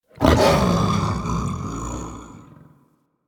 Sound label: Jump Growl C; Enemies; Vocals; Warframe; The New War; Archon; Amar